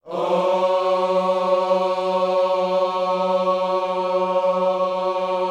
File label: OHS F#3, Gospel; Spectrasonics; Vocal Planet Vol.1 Gospel; akai